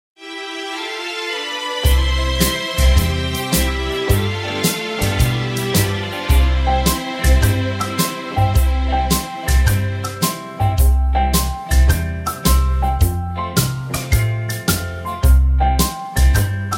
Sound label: (C) No Backing Vocals